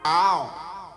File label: auhwuh